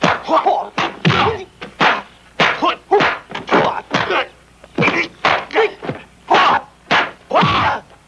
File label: martial arts